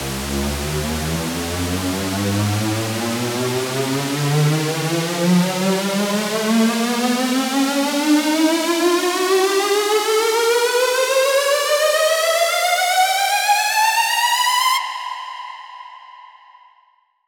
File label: Square C 8 Bars (Higher)